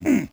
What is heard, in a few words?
goblin away; Crypt of the NecroDancer